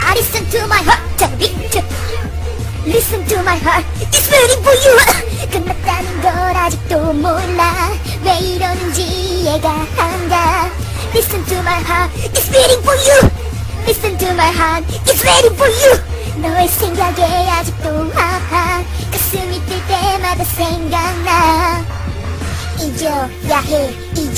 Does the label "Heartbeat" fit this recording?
Yes